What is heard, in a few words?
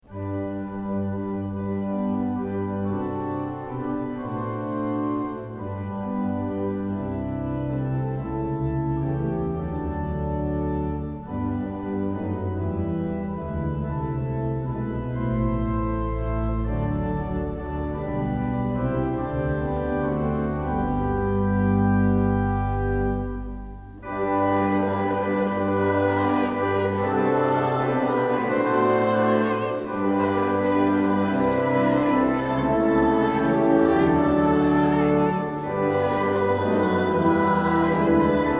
hymn